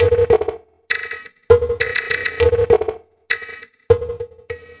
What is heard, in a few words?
Psychic Beat; Sonic Foundry (Sony Creative Software); Loop Noir; Session 08 - Psychic Investigator